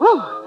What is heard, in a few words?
"woo" cartoon; ooh ahh; ho hoo woo